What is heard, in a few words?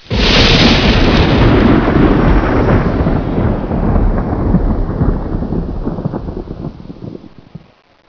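thunder; enpro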